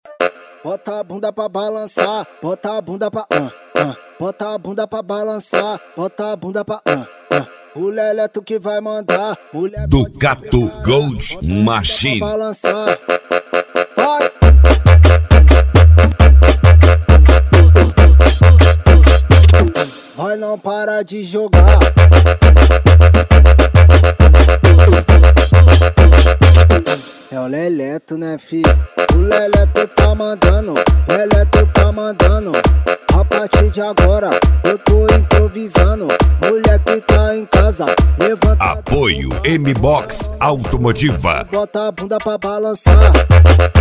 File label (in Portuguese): especial funk